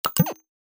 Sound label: Select; BulletHellTemplate